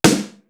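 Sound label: TOUGH SNARE; East West; Global Sounds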